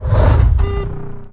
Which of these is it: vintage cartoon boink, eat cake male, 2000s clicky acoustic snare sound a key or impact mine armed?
impact mine armed